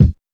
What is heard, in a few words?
clean kick drum single hit d sharp key